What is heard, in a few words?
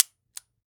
gun revolver 38 colt python dry fire